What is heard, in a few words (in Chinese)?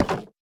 下界木栅栏门开关声